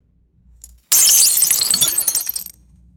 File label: plate glass shattering and shards scattering